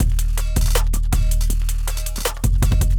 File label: Drums With Percussion, hyperpop beat